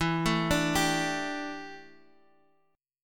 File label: G Suspended 2nd E, Guitar; Gsus2/E arpeggiated; JGuitar